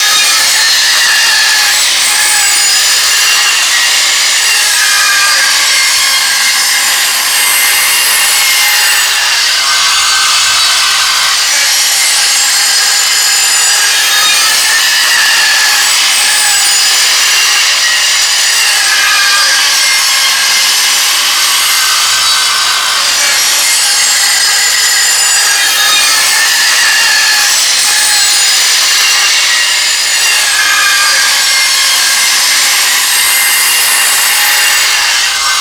chopsaw cutting loop; Modern Warfare

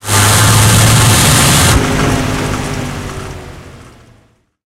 m1 engine load, tanks; War Thunder

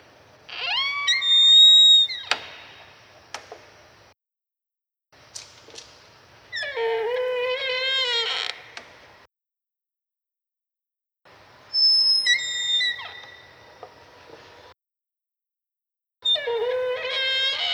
shutters swing; Call of Duty Modern Warfare

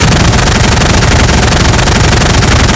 Automatic Tunguska, Weapons; DCS

